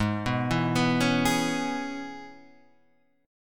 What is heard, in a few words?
Db Minor 7th Ab, chord